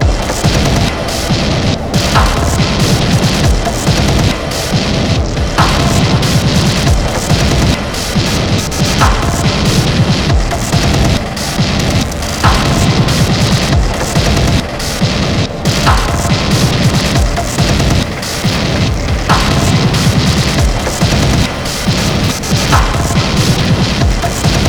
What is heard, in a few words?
hallway loop, music